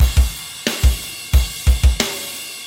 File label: metal